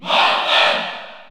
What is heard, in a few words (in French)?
Cheer French PAL; Morton; SSBU